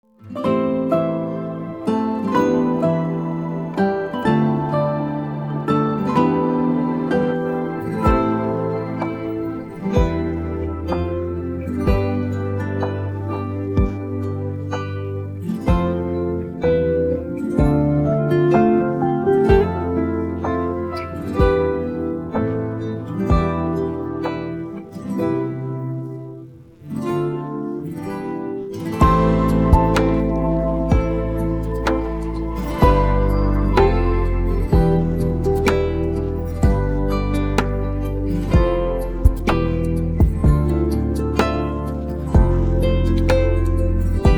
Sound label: instrumental, persian